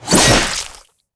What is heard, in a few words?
Killing; Knight Online